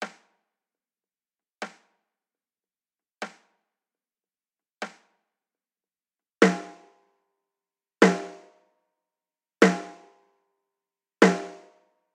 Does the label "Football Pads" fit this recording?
No